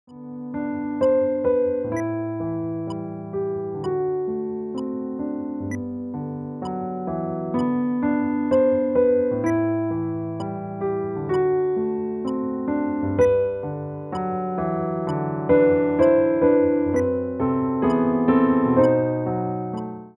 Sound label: PIANO Berceuse